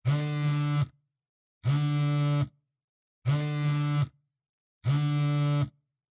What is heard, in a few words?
Cell Phone Vibration Cloth